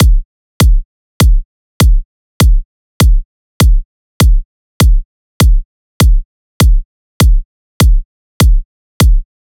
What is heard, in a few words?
Drum Loop 100 BPM Kick, Midtempo; Cymatics; ANALOG EVOLUTION Beta